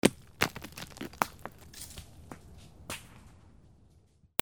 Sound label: FALLING